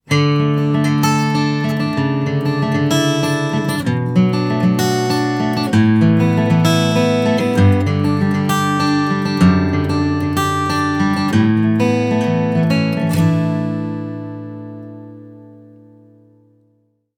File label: Acoustic, ringtones; Marshall; London KB 1501